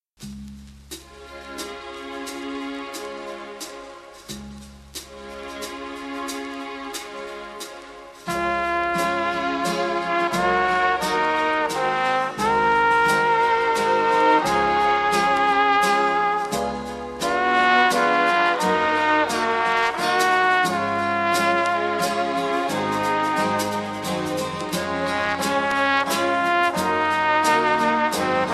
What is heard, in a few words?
Andante From The Violin Concerto In E Minor